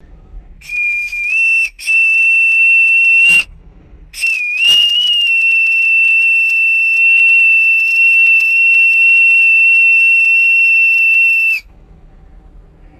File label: boatswain pipe blowing a nautical call